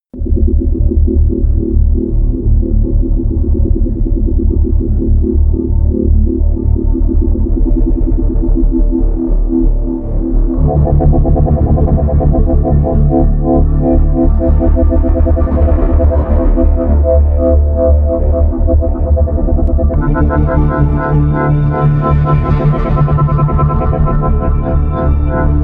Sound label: wobbling revolver, music elements